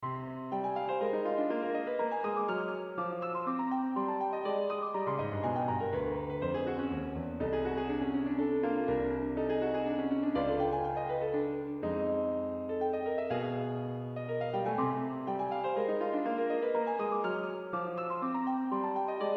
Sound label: Gigue in C minor; Aaron Alexander Cotton